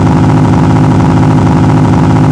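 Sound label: REVS LOOP YBR, motorcycle; YBR125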